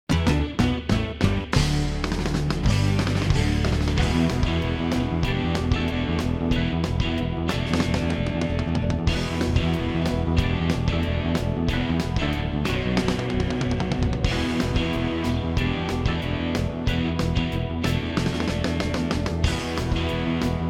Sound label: Rock, music